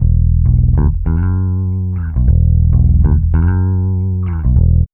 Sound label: BASS, Funky Ass Loops; East West